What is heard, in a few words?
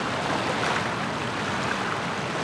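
waves loop; Tony Hawk's Project 8; XBOX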